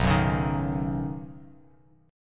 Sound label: B Major Add 9th, Badd9 chord, Bass Standard; strummed